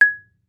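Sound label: Marimba rubber fortissimo Ab4; MIS Pitches - 2014; Percussion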